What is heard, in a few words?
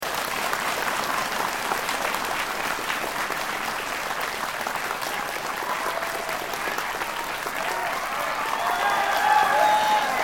Applause Cheer Stereo; The Audience at CGBC 2009 make some noise for me; Applause (Sound Effects:Human)